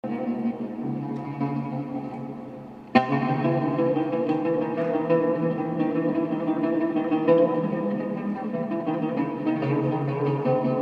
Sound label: loop